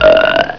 giggle; quake2

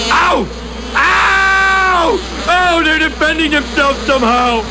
death; quake2; homer1 4

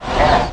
jump; quake2; makuta

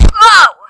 fall; quake2; austavin

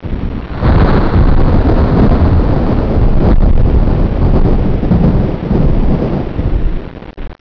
thunder; quake2

